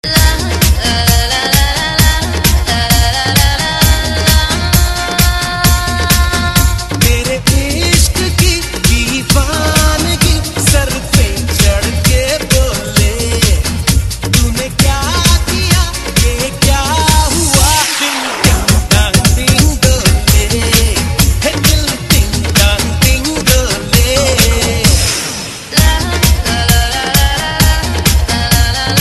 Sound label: Ding